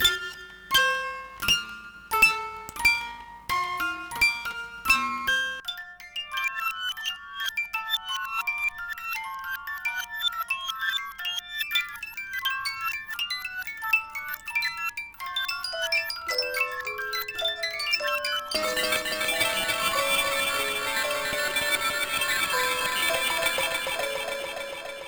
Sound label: Haunted Music Box